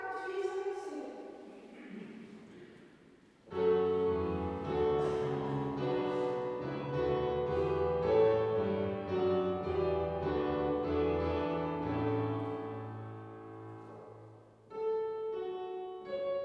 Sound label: Taize Gloria Deo SATB